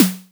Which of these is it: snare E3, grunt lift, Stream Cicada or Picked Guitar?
snare E3